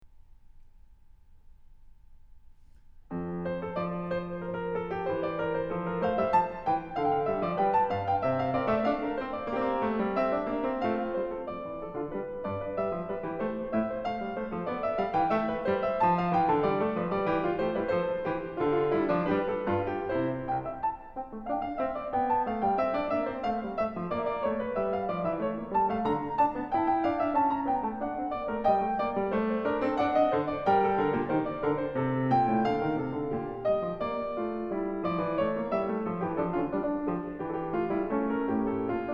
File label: Sinfonien